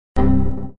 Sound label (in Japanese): Windows XP風エラー音